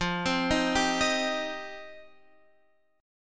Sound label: F 7th Suspended 2nd F down Guitar Half Step Down; F7sus2